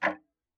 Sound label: Tick, Clocks Kitchen Clock